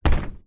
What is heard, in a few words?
footstep single flip flop on metal external platform floor